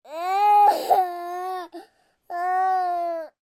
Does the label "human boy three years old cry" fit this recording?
Yes